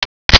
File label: clip insert